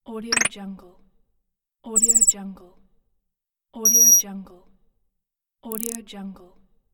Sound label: dropdown options list